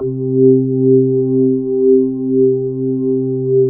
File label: Low Slow A4, B3; SoundFont - The Developer's Choice - Volume 1